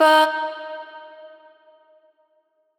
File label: Wet One Shot - D#, Vocal; Tonal One Shots; Cymatics; Euphoria Vocal Sample Pack